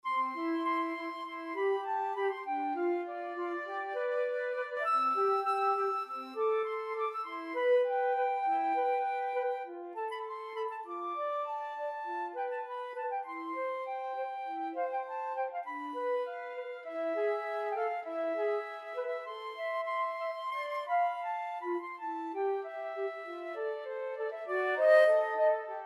elgar chanson de matin, flute duet

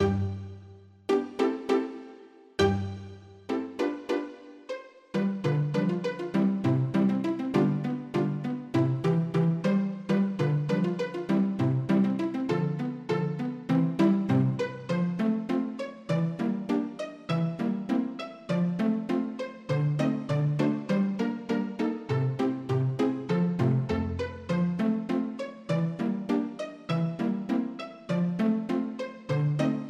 pizzicato polka, string quartet

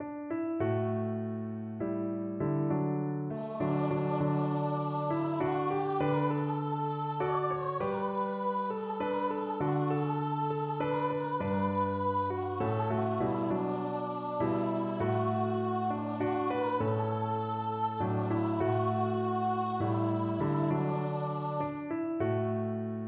PNO

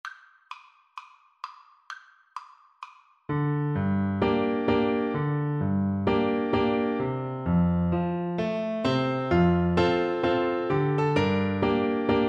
swallowtail, Recorder

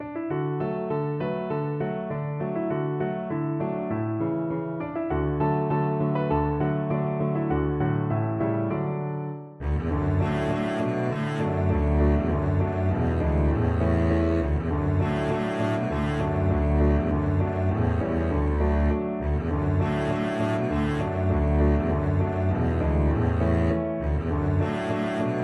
oh susanna, double bass

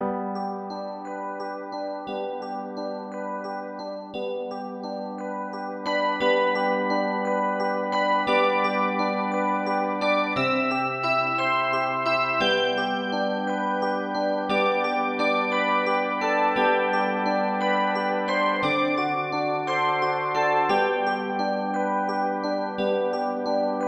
handbells

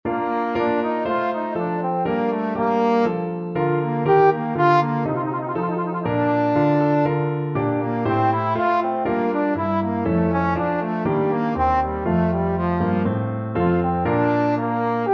sonata, trombone

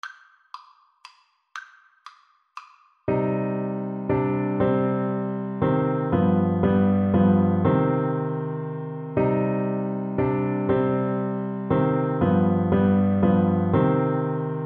we three kings, Trumpet

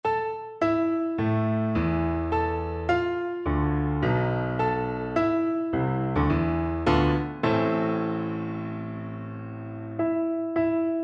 high germany FL kar, Flute

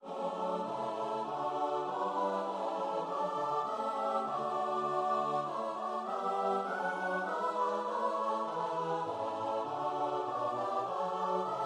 now blessed be thou, choir

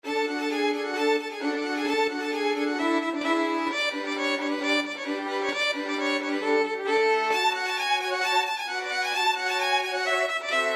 violin ensemble

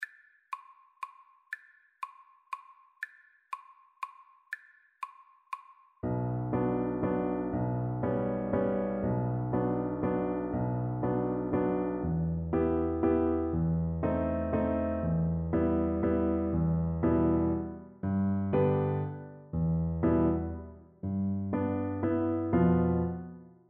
waltz, cello

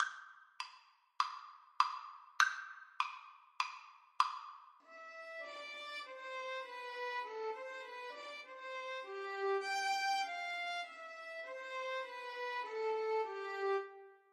schumann melody violin duet

